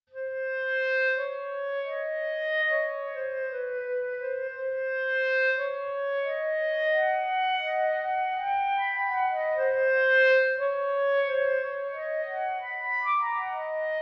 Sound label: orchestra